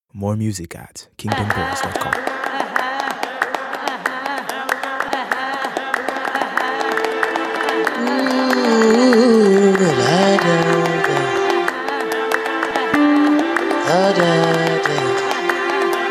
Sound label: M Strings